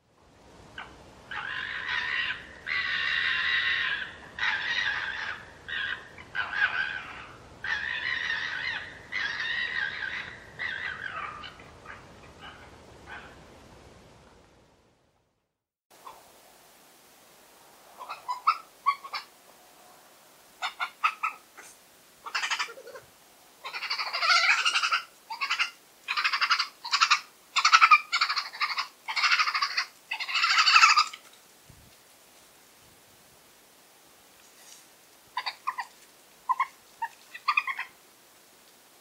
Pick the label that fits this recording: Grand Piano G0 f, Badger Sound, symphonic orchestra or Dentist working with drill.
Badger Sound